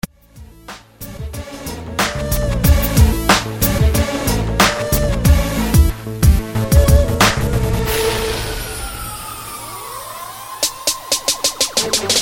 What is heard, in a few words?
Beat